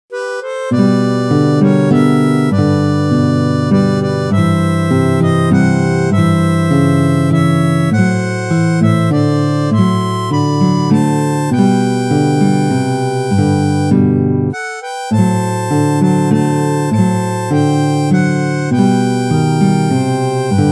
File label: The Old Rugged Cross Harmonica